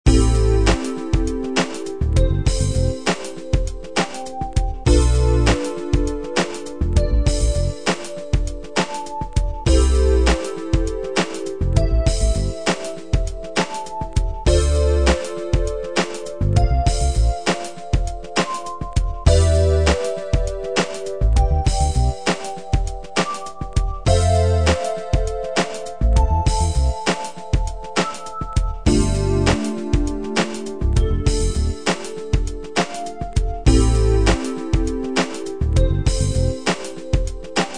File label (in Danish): Funk akkorder & tromp